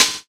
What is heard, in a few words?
SNARE; E-Lab; X Static Goldmine 2; akai